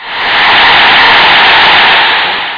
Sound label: CHEER; SPORT; BBC MATCH